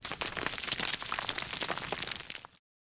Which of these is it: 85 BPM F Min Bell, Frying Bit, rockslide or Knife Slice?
rockslide